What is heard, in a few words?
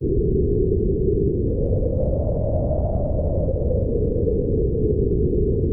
Wind